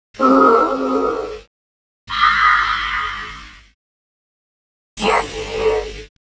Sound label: slowmo enhanced